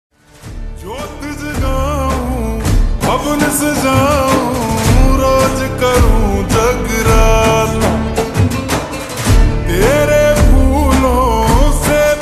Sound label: Slowed Reverb Ringtone